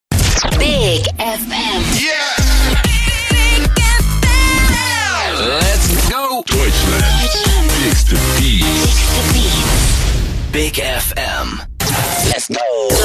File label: Jingles; bigFM